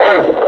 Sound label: pain, suppressor